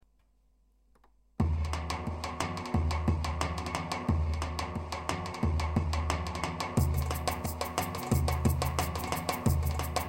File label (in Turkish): zurna, pa 500; turkiye